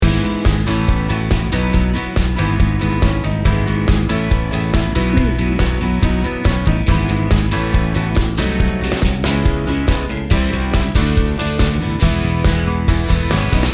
showtime rock loop

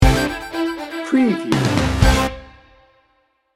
party pop rock sting bumper

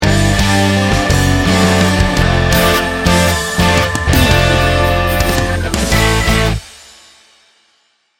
river ride sting bumper

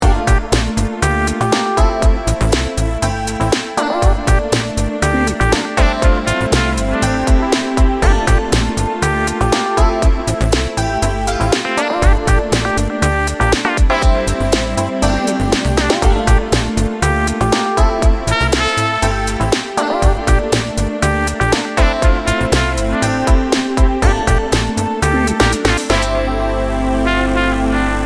smooth jazzy laid back

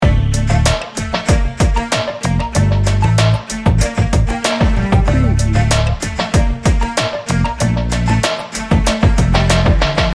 loop

